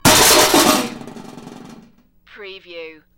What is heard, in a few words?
Metal Debris Impact Settle